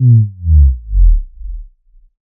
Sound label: SubDrop Bold Gate